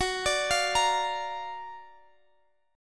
Gb Augmented Major 7th, down, Guitar, Half Step Down; Gb+M7 arpeggiated